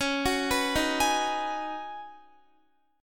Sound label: Db 7th Suspended 2nd Suspended 4th, Guitar; x,16,16,16,15,15; Db7sus2sus4 arpeggiated